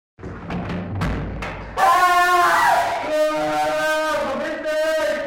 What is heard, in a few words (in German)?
schreien